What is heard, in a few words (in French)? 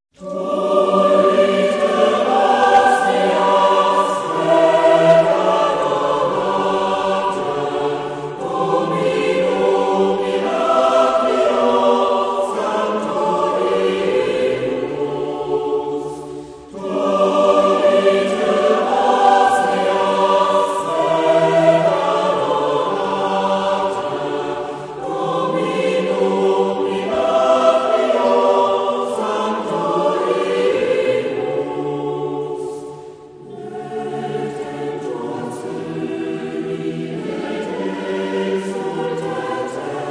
choeur